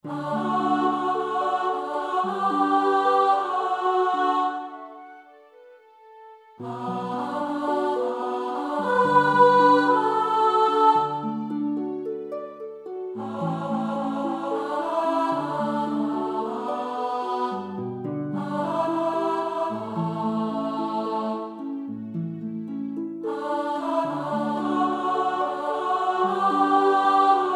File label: Alto